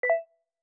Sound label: GENERAL ALARM